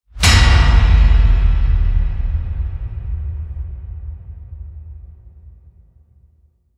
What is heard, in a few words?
Trailer Tools Metallic Hit; Production Music; CATastrophic